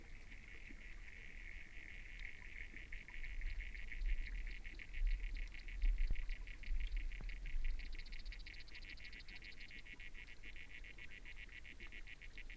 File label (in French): Criquets sous marins